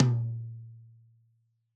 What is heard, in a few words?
Tom High; One Shots; Drums